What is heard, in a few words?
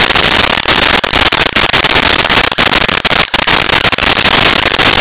pod binder idle loop